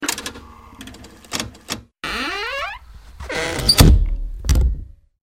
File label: Door closing